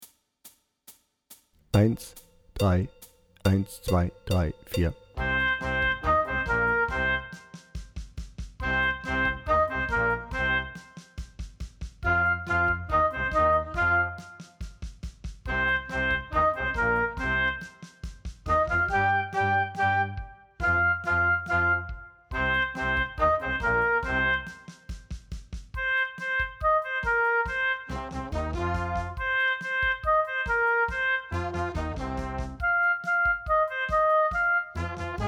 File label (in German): Hard Rock Blues Oboe lauter